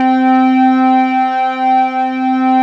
C3 SYNTH, SYNTH ORGAN; AKAI S-Series CD-ROM Sound Library VOL-7

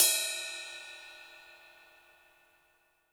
Gretsch Ride Bell Hit; Sonic Foundry (Sony Creative Software); Vital Drums

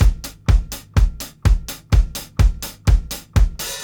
125 4 FLOOR KICK, DRUMS LOOPS; BASEMENT DRUMS VOL 2

